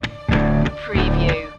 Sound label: southern thunder stinger